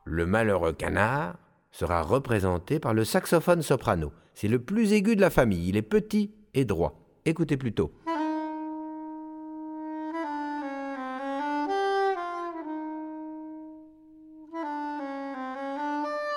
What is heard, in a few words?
sax sop jazz